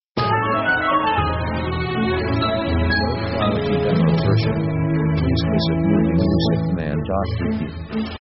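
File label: orchestra cheerful walking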